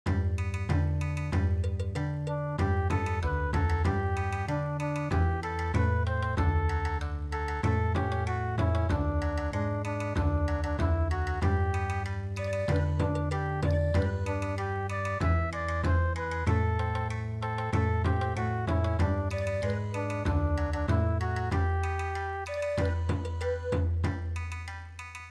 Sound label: Bon Odori